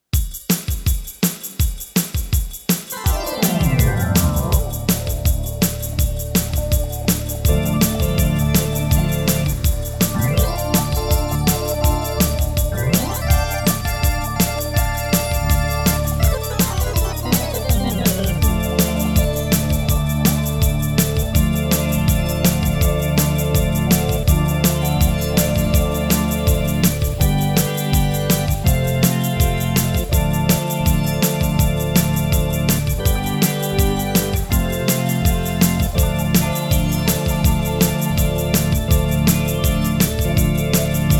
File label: Instrumental